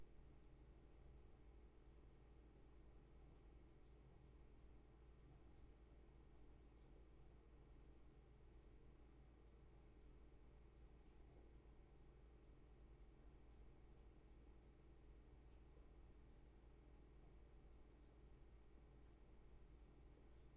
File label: room tone